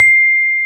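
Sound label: C long C6, vibraphone; AKAI S-Series CD-ROM Sound Library VOL-7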